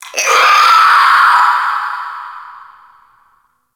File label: Infested Crawler Dist Vocal; Warframe